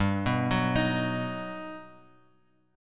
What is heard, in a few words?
G Augmented Major 7th, Bass Standard; G+M7 arpeggiated; JGuitar